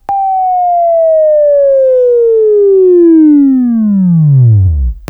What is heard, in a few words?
WAV Right, Sweeping; Sweeping Training program